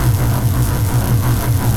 Sci-fi Electricity Hum Loop

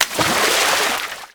Bodyfall Deepwater; SFX Kit; Bodyfalls

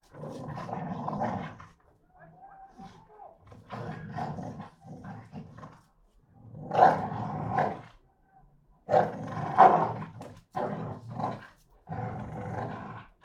dogs - Pit bull Pit bull fight; Tessa; Bahko; NS Studios - ultra sound collection; animals

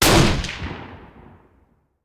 Rifle; Sound Ideas; Guns

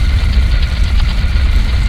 Scrape Heavy Stone Loop; Doors

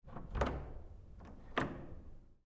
Double Door

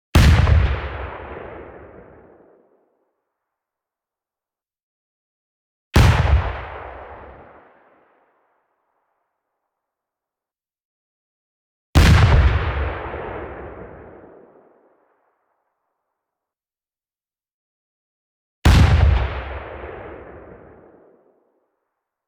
EXPLOSION SHORT Tight Crack; boom library; Anime Essentials

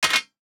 DICE on Metal, Drop, Standard, 5 Five Dice; Samples; ArticulatedSounds; DICE Sfx Party (Extended)

